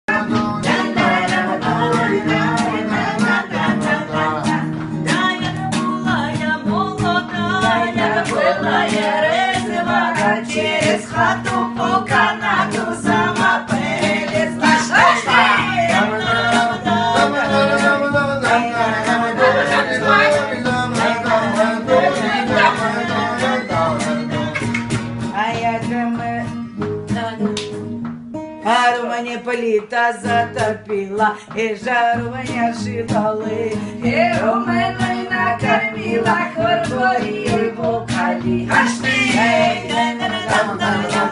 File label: gypsy songs цыганские частушки